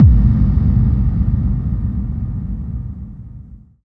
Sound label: BD-FX, Dance Mega Drums; Best Service